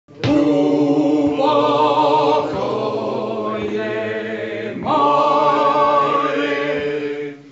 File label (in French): basses; Plovi